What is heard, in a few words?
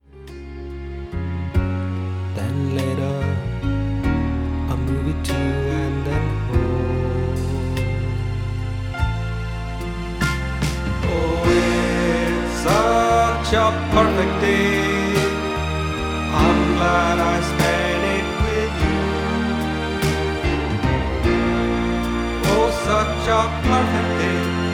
chorus with adt